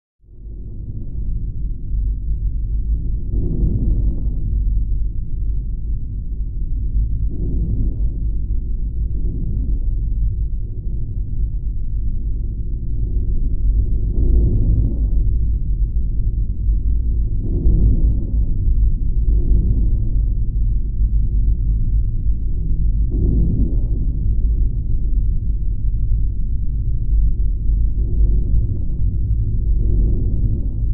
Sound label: Bed Galactic Flutter